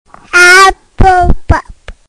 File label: eyeball pop